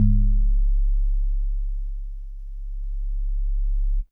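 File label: House Organ DX100 C#3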